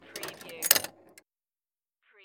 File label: Pinball sound, roll and multiple clanks